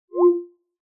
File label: save; accessibility signal